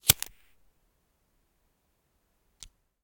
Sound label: lighter